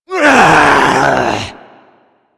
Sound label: Zombie Attack, Vocals; Mariner Zombie